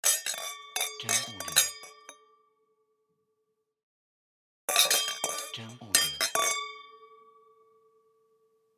Clinking Glasses Wine

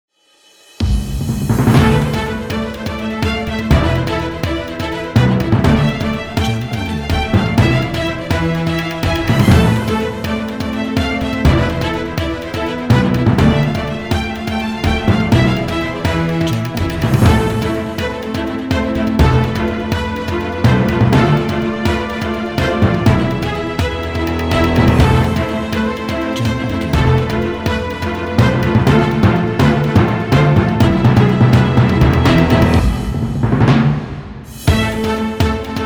Emotional Epic Trailer

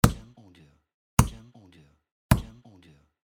Meat Punch

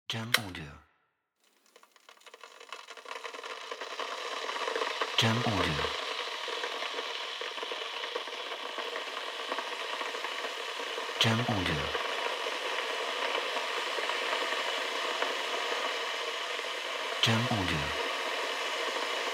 Boiling Kettle Long

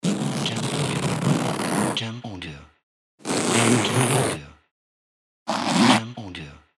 Wide Thick Zipper

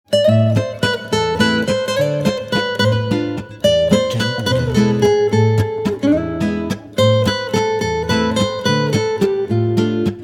French Guitar and Accordeon

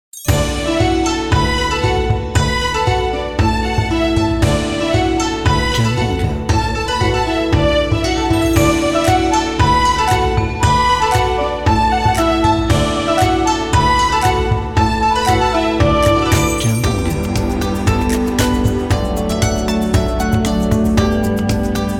Happy Asian Background

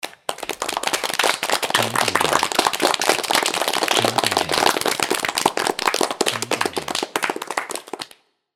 Applause

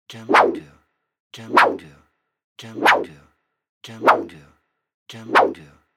Whoosh Fast

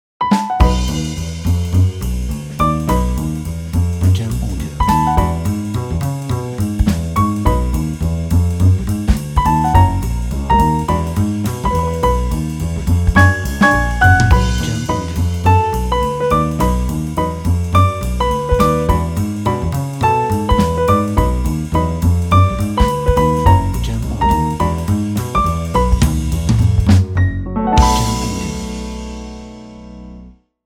Jazz Quick Swing

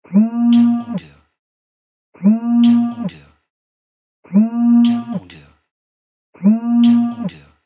Vibrating Phone

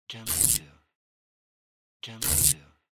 Scotch Tape

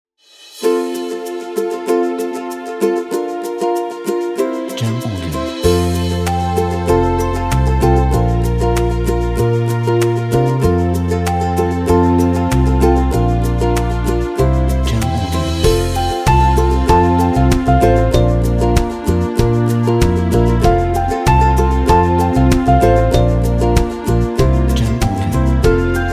Happines Folk

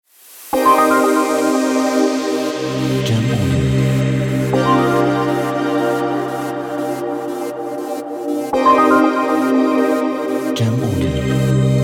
Broadcast Intro

